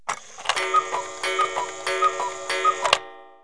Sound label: CUCKOO; Household; Chimes